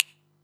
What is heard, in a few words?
Percussion; Vengeance Samples; VEH2